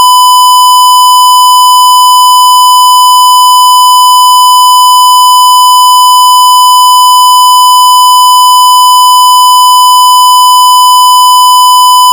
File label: 1khz square